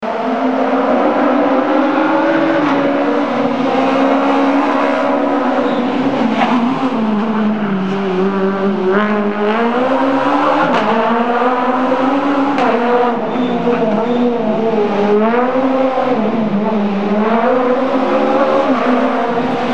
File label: Citroen C2 S1600; Jean Joseph